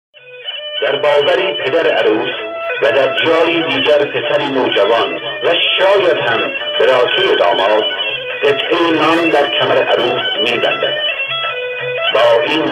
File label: iran kurdish music mariage